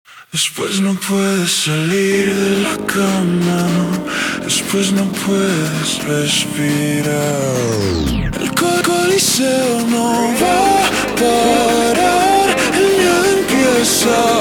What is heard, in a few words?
slowed